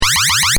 Retro SFX loop, Retro Style Loop, Generic Game Style; Tech; Stuart Duffield